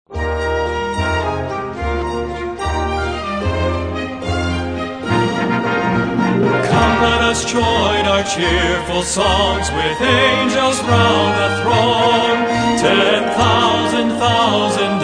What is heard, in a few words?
Singers And Orchestra